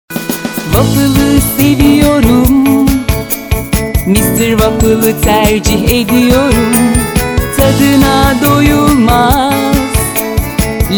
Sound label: jingle; mr waffle